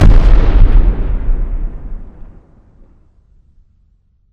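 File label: explosion distant kaboom; corporate; Titanfall 2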